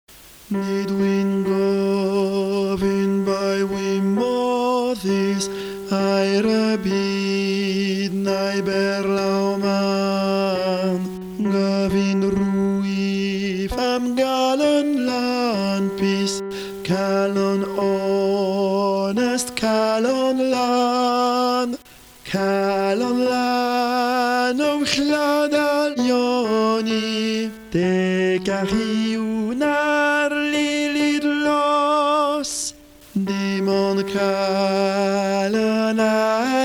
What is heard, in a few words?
tenor; calon lan